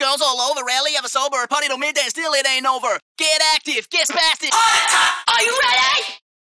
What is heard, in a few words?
vox